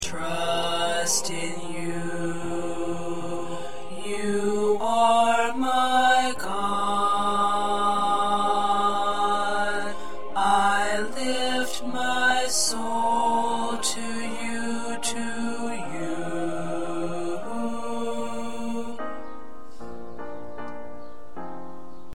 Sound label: Bass